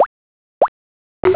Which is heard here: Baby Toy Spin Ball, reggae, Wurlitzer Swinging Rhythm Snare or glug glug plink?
glug glug plink